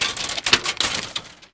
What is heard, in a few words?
Metal Fence Clattering